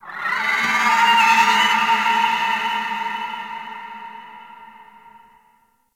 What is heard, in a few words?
Ocean Underwater Creatures Small; Ambience; Warframe; Grineer Ocean